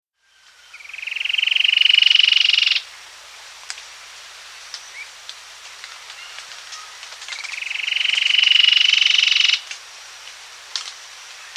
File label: Cherrie's Antwren; S. America